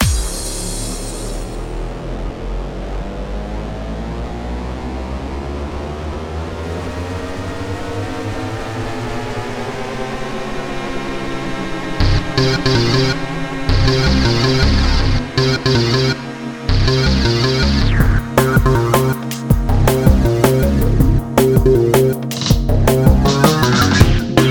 Slower but invigorating, "outer space exploration" style